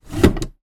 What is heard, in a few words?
household computer desk drawer wooden pine open fast; zapsplat